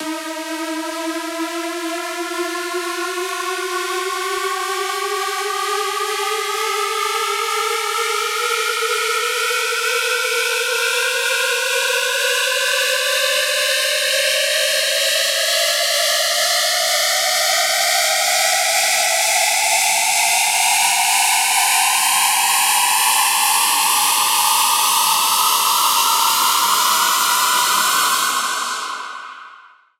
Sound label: uplifter 24 d 16 bars